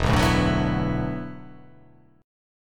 E 9th Suspended 4th E down

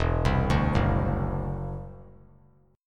E 7th Suspended 2nd Sharp 5th, E, down; E7sus2#5; arpeggiated

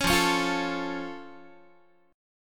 F Augmented F Guitar; F+ strummed; 16,13,16,15,13,x

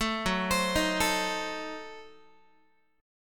F sharp Diminished 7th F sharp Guitar; F#dim7 arpeggiated; 12,14,15,13,13,x

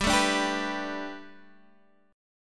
A 7th Suspended 2nd Suspended 4th

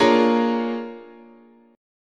Bb 7th Sharp 9th, chord, guitar

